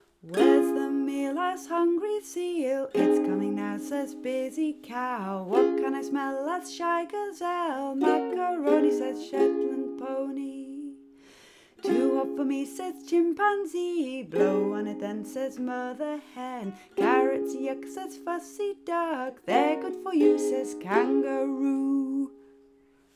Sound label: Full Song